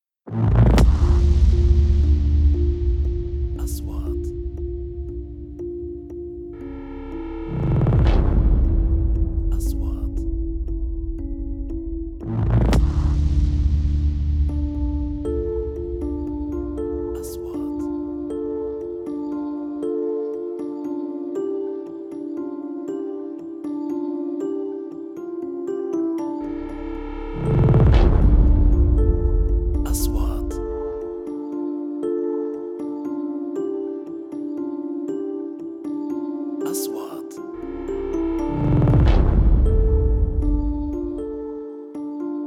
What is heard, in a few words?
Triler Intro; مقدمات تشويقية